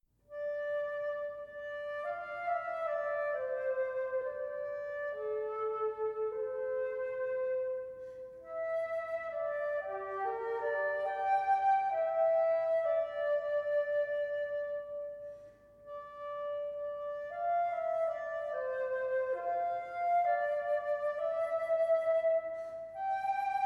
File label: suite for flute ensemble; From Norway